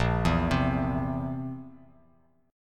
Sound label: Bb Suspended 2nd Flat 5th, Bass Standard; Bbsus2b5 arpeggiated; x,1,2,5; JGuitar